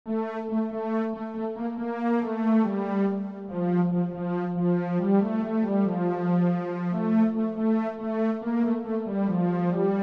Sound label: Regrets And Faults Instrumental; Deus Ex; Mod soundtracks; Deus Ex Apocalypse Inside